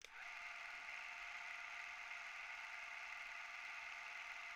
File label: Newsreel Projector On Idle; Sony Pictures Sound Effects Series; Period Devices